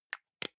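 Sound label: deodorant can lid close soft, badezimmer; haus-garten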